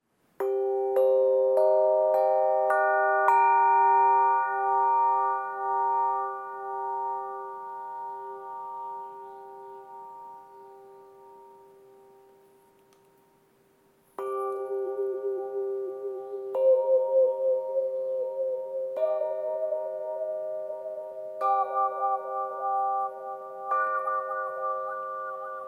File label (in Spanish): Wayunki Sol Domin 440